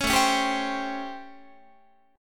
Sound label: A 7th Sharp 9th, Guitar; A7#9; strummed